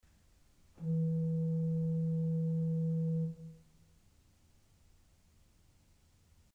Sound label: Principal 4' E, orgel pipes